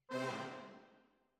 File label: Horns